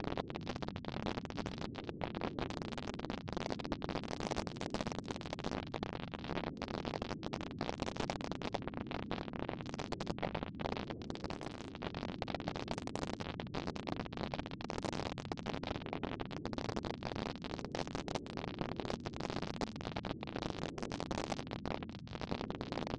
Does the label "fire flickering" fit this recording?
Yes